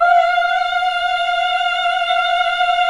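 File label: HMM F3, choir; Best Service Gigapack 1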